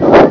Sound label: fire